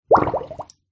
household bathroom basin water bubble plug hole after draining